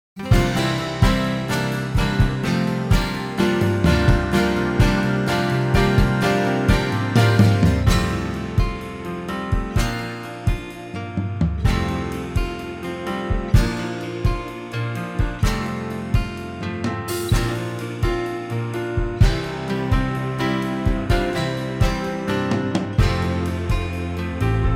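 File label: F, Backing Tracks for Male Vocals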